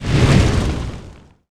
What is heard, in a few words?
Action Large